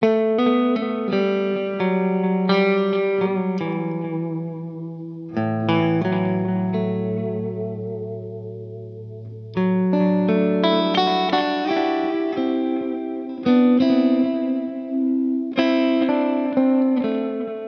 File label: solo